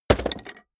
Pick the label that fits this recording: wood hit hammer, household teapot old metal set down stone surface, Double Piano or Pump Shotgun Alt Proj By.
wood hit hammer